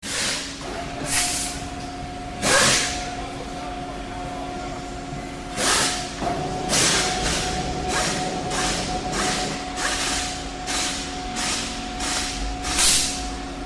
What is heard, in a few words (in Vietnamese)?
tiếng ồn ở quán sửa xe gara sửa xe